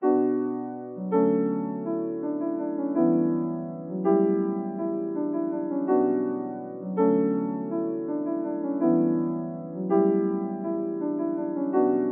164 BPM B Min Piano; Loop Stems; Cymatics; Rose

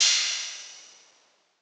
Crash, Cymbals

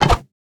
Attack Bow; Game Essentials

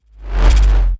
Break Mono Jolt

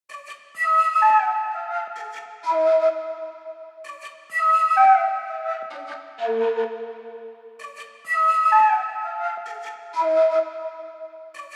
128 BPM C# Min Quena, Loop Stems; Melodies; Cymatics; 2022 Melody Mystery Expansion; Chicago

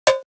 Alternate Drum Loop 125 BPM Cowbell, Analog; Drum Loops; Cymatics - ANALOG EVOLUTION Beta

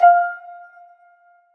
Riddim Melodic Hit E; One-Shots; Coded; Ghosthack; Riddim Essentials Volume 2

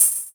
Open Hat, Hip Hop; Drum One Shots

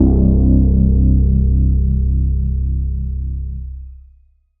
Juno-60 BASS Wide C; Synth; One Shots; Cymatics; Fantasy Synth Sample Pack

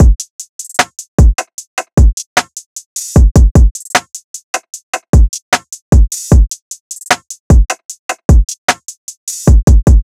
Drum Loop - 152 BPM; trap; Drum Loops; Affiliated Drum Loop; Cymatics; Trap Starter Pack

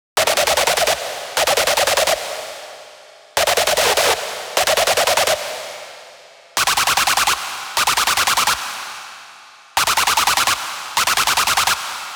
Screech G 150BPM, Rave; Techno; Melody Kits; Stems; GhostHack; Ghosthack - 7 Free Rave Melody Kits 2025; Kit Chaos - G 150BPM

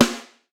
Snare Acoustic; Drums; One Shots; Abolish; Ghosthack - Drum Hero 5

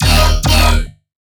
Melodic Bass D# 140; One-Shots; Bass Hits (Melodic); River; Ghosthack; Color Bass Essentials

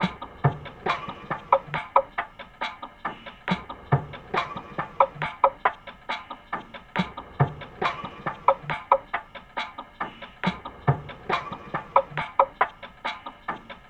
Patience Perc Loop - 138 BPM; Cymatics; Fiji Hihat Loops & Percussion

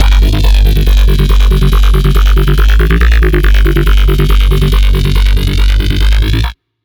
Bassline C3 140, Dubstep; GhostHack

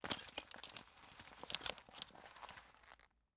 Belt Buckle Movement; Fairlight Sound Lib

